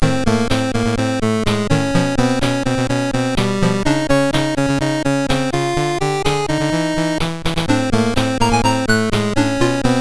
sid MOS 6581R4; Very Cute Lemmings; hvsc

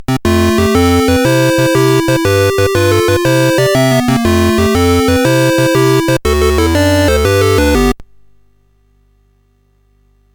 sid CSG8580R5; Wizard of Oz

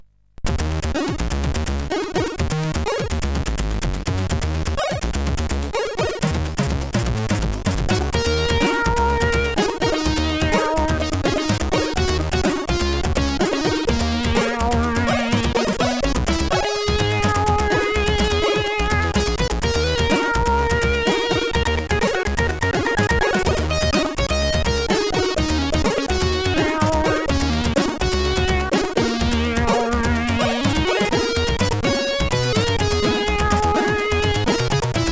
Background Noise, CSG8580R5

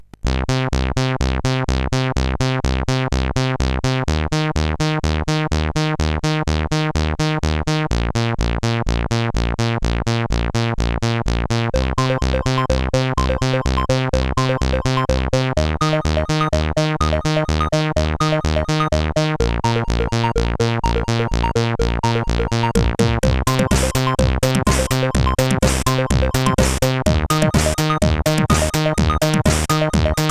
Shuttle X, sid CSG8580R5

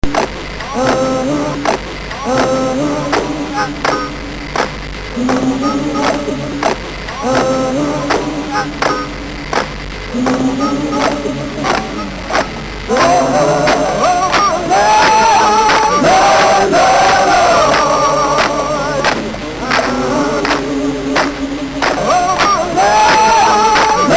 Blus, sid MOS6581R3; hvsc

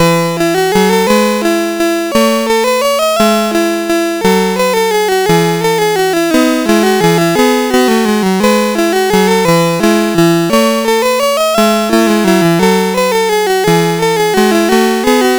Menuett, sid CSG8580R5; Music Shop

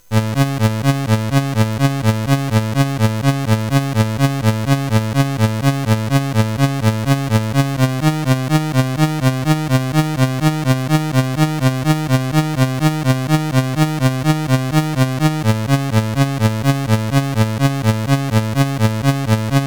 Infernal Rhythm, CSG 8580 R5

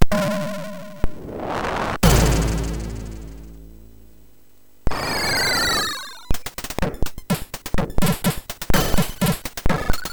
Intro Music, sid MOS6581R3; hvsc

